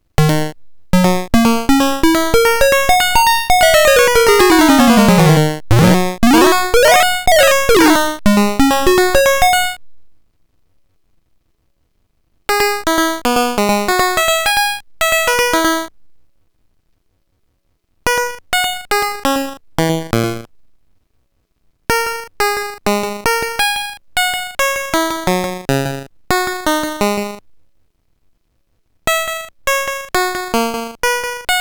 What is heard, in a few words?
pulse delay test, 2A03